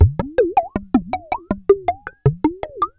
PERCUSSN, Ambient, Drum

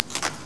horse menu popup; 250 Desktop Themes

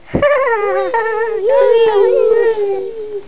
kids; Greatest Stories

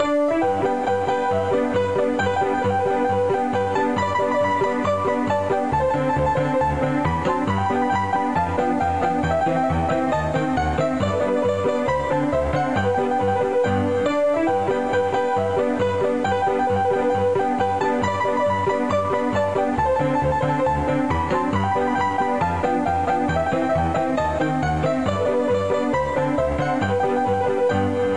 Music Loop

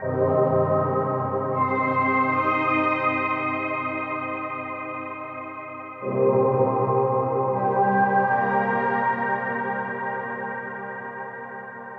SYNTH PAD AMBNT 160 C, Ambient; eJay; Sphere

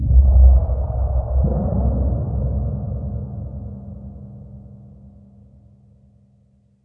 blowaway

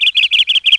tram; NFS High Stakes

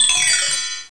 star bell

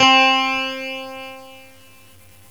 Clean Guitar

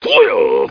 SW YELL

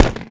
EX POP

